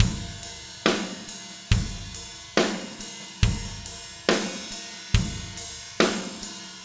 Powerballad Drums Chorus, Slow Tempo; Pop Rock; Digidesign; Pro Tools; Pro Tools Essential Loops

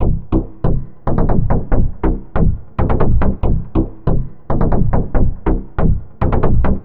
140 House Bass Cmin; Dance DJ; Digidesign; Pro Tools; Pro Tools Essential Loops

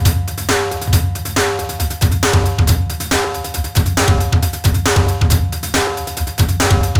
137 Garage Ringing Drum Loop; Dance DJ; Drums; Garage Breaks; Pro Tools Essential Loops; Digidesign

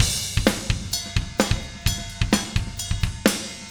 129 16Th Rock Drums Chorus; Pop Rock; Fast Tempo; Digidesign; Pro Tools; Pro Tools Essential Loops

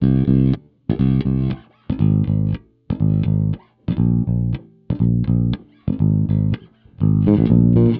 120 Pop 70s Bass Cmaj; Pop Rock; E-Bass; Pro Tools Essential Loops; Digidesign

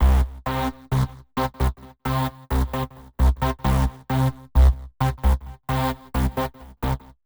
132 House Arp Cmaj; Pro Tools Essential Loops; Digidesign

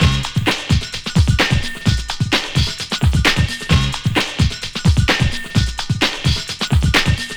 130 Dance Combo Beat Cmaj; Dance DJ; Pro Tools Essential Loops; Digidesign

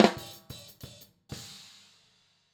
Snare; Pro Tools Essential Demos

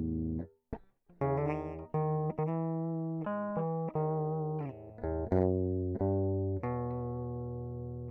Rhythm Guitar

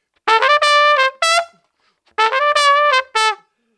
125 NuJazz Trumpet _Dmaj; Brass; Pro Tools Essential Loops; Digidesign

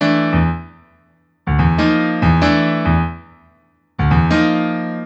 095 Acid Jazz Piano Emaj, Grand Piano; Digidesign; Pro Tools Essential Loops

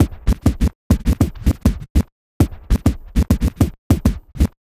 Vinyl Scratch Kick

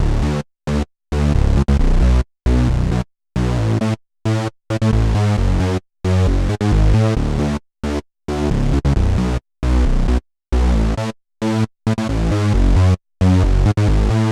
134 House Buzz Bass D maj; Dance DJ; Digidesign; Pro Tools; Pro Tools Essential Loops

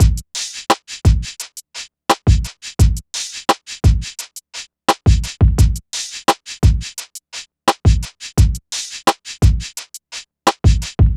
Hip Hop Beat, Drums; Drumbeats; Digidesign; Pro Tools; Pro Tools Essential Loops

